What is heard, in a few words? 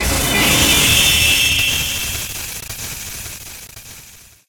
scare; chica; Five Nights at Ronald's